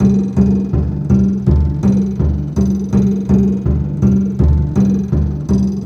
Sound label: Remixed Acoustic Bass